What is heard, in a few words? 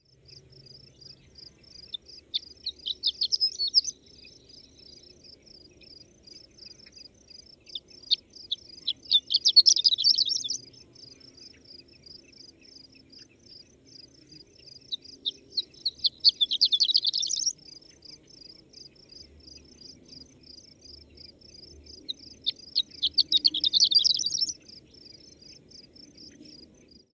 Lark Horned